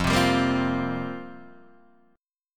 F Suspended 2nd Suspended 4th F down Guitar Open D